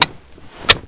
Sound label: Inf Weapons Foley 3rd; Red Orchestra 41-45